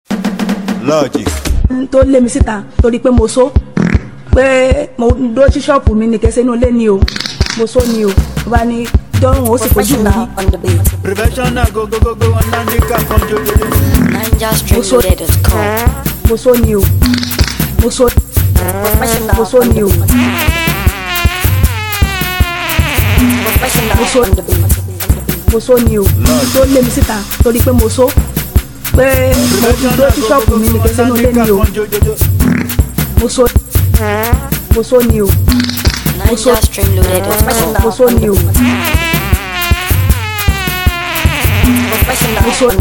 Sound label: Professional beat